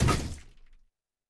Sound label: Roland TD-20 Percussion